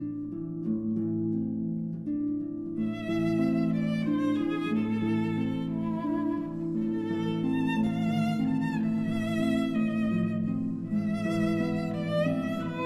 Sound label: Berceuse, for violin and harp